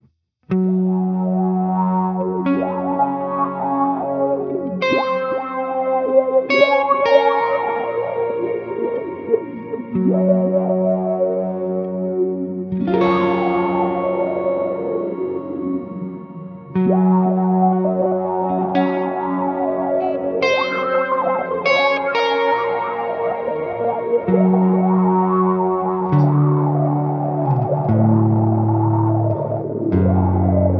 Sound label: test reverb z51 helix